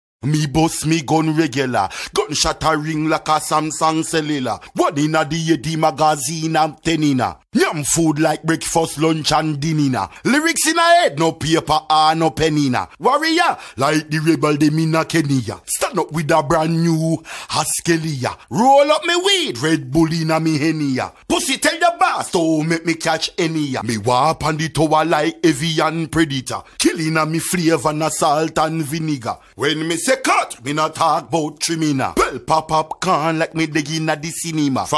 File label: Acapella 87 BPM